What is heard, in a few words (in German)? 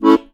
HOHNER, AKKORDEON